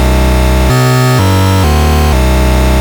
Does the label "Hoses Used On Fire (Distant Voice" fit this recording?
No